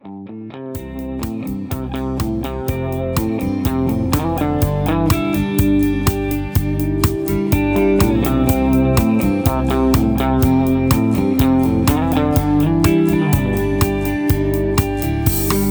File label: Instrumental Melody